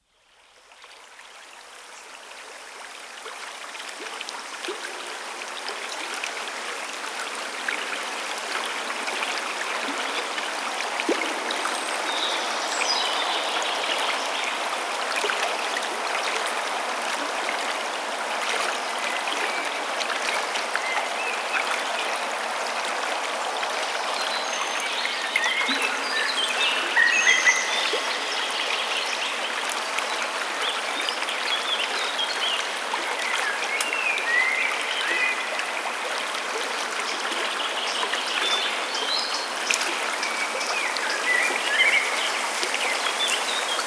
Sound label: Ambience - 120Bpm; Ghosthack; SkyRealm; Kit 23 - Victory On The Reach